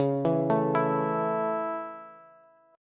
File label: C sharp Minor Sharp 5th, Bass; arpeggiated; chord; 21,19,19,21; JGuitar